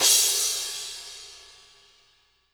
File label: crash, virtual drum sounds